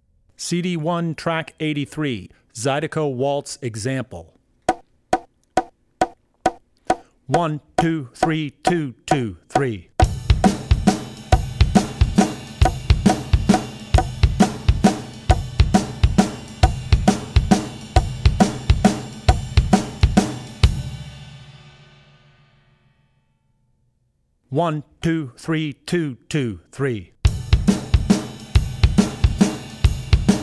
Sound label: Zydeco Waltz; Drummer's Bible Tracks